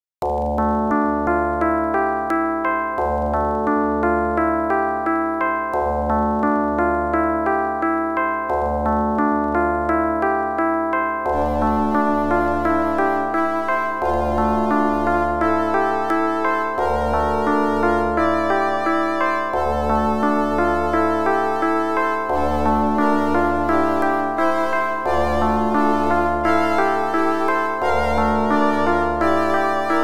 OPL2; Tyrian

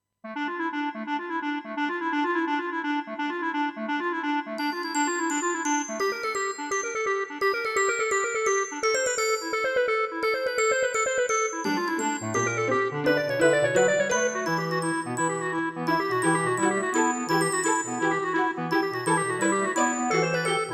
SC880; QFG3

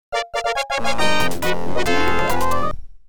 OPL2; Imperial Fighter Destroyed #2; X WING

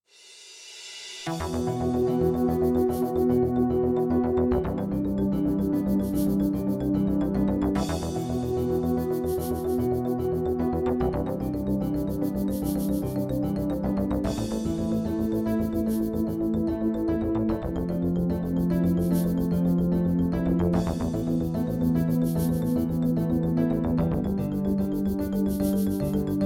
SC880

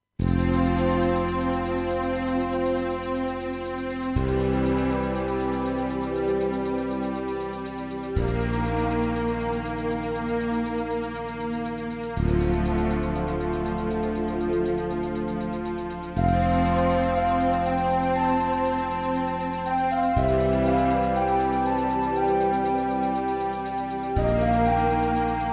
SC880; Dune 2

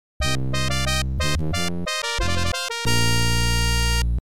OPL3

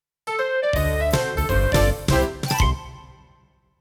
CM500 GS; LSL3

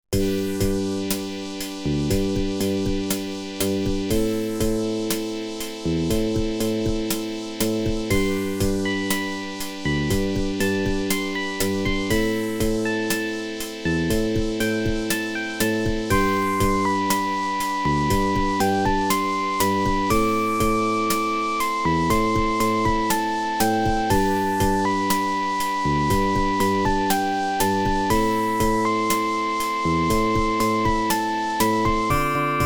ADLIB OPL2

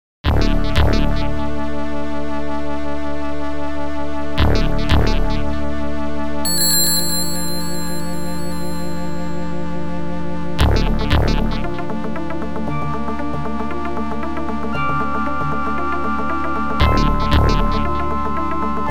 OPL2; Tyrian

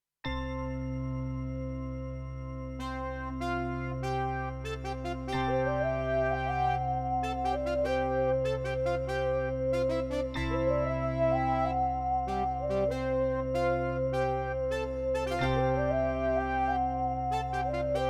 CM500 GS; LSL3

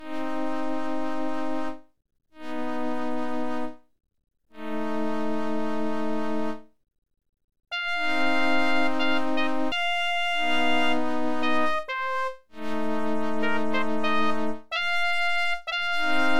OPL3

